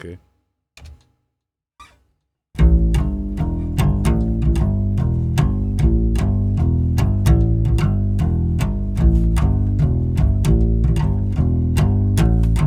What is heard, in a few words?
XXL acoustic bass 150